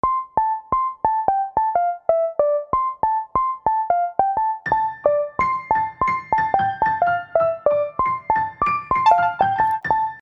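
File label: cheerful keys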